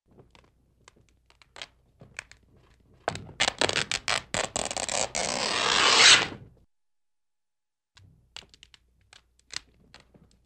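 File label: Long Slow Tears with quick Rip At Tail, cartoon; Hollywood Edge; Cartoon Trax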